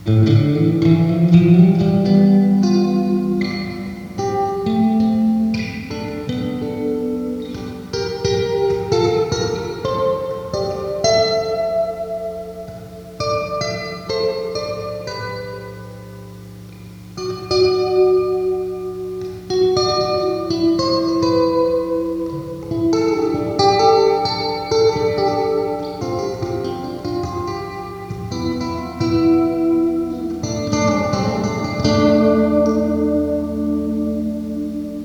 bridge mid pickup sm58 guitar left stereo rev clipped; mobilepre